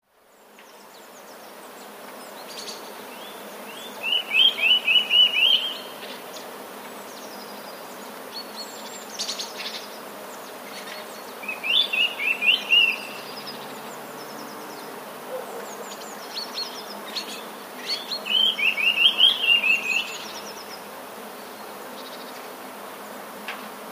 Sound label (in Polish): kowalik